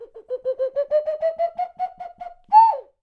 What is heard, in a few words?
jungle; Voice Crystal - Steve Reid Definitive Percussion